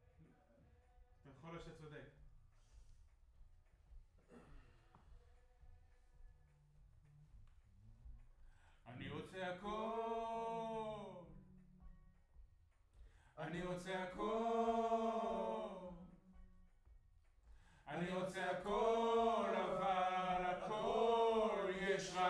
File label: Choir Far